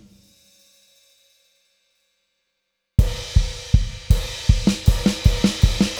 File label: Music Down Beat Perc; Plants vs. Zombies Battle for Neighborville; GnomeGnight